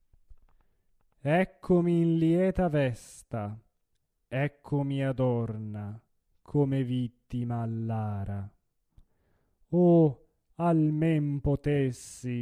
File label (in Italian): Oh quante volte recitativo (espressivo); Bellini